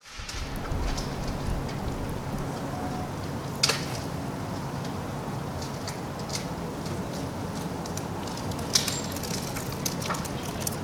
Nature, Ambience

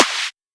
Snare, Dubstep Snares

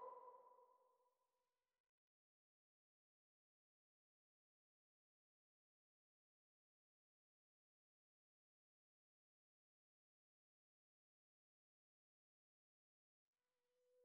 145 BPM F# Min Reverse Accent; Melodies; Loop Stems; Cymatics; 2022 Melody Mystery Expansion; Overdrive

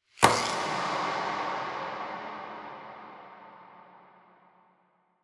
Wood Scraper; Ghosthack; SkyRealm

